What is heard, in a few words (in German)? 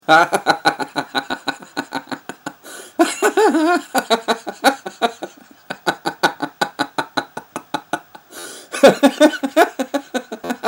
lachen